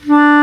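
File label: Bass Clarinet p D3; Multisamples; Digital Sound Factory Virtuoso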